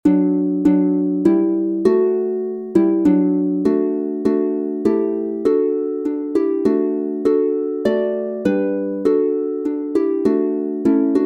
Marienlieder Wunderschoen praechtige F Dur D Dur instr, Harfe

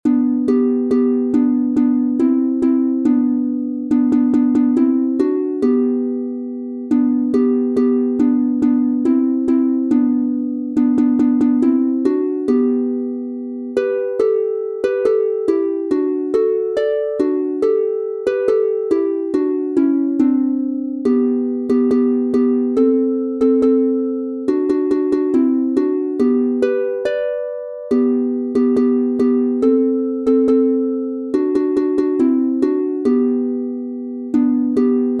Seemannslieder G Dur 2 st instr, Harfe; Ein Mann der sich Kolumbus nannt

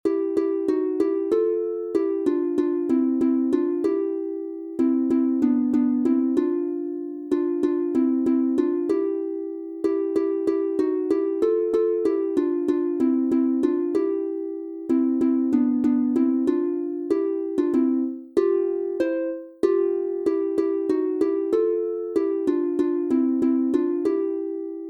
C-Dur 2 st instr, Harfe; Unser alte Waba

